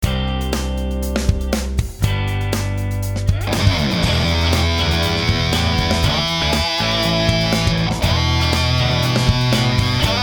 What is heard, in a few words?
Solo